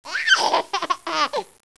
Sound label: baby laugh